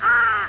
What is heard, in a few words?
bird; Quake; PainKeep